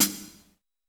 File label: Boss DR880 hihat